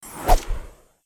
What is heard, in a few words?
Summary Whoosh; aronwk collection; ndaudio